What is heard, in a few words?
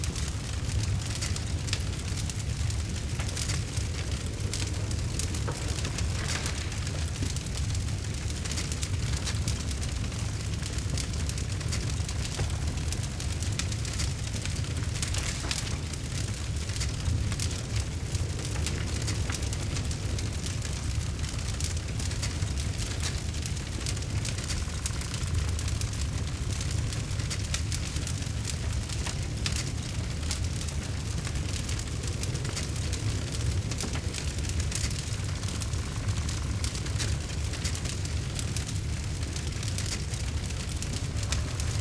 fire, ambience